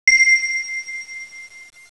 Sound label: ping